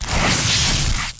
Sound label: Melee Swipe; Grounder; Evolve; Kraken